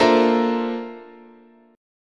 Bb Major Sharp 11th, chord, guitar

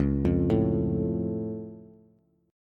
C sharp Augmented down Bass, chord

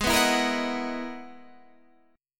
E-Major Sharp 11th G sharp

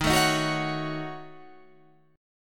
Eb Minor 7th Flat 5th

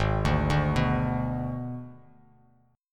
G sharp Diminished, Bass Standard; G#dim arpeggiated; JGuitar

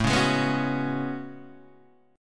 A Major 13th, Guitar, Open D; chord

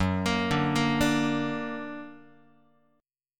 F sharp Augmented F sharp Guitar; F#+ arpeggiated; x,2,1,0,2,1

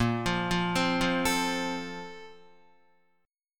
A sharp Major 7th Suspended 2nd, Guitar; A#M7sus2; arpeggiated; JGuitar

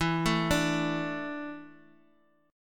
E 7th Suspended 4th

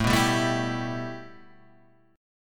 A 7th Suspended 2nd

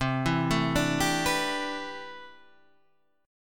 A Minor 11th C, Chord

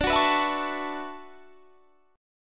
B Minor 11th D

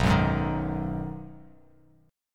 B 6th B down, chord; strummed

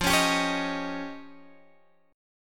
F 7th Suspended 2nd Sharp 5th, Guitar, Drop D

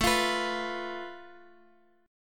A Suspended 2nd Sharp 5th, Guitar, Open D

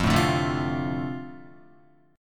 F 6th, Guitar; F6 strummed

